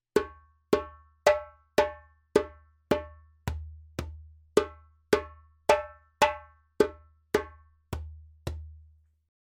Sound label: djembe; djembebog oevelse